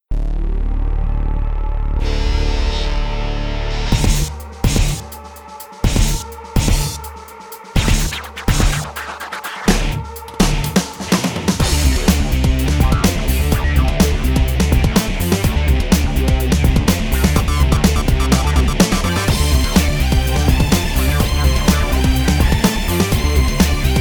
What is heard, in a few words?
BPM 6 ROCK HYBRID